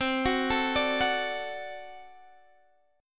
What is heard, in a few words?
D 7th, C